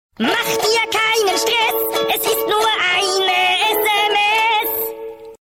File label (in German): deutscher klingelton